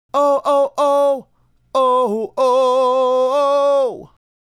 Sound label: Male Solo, Hip-Hop and RnB Vocals